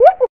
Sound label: whoop woo, voice; ooh ahh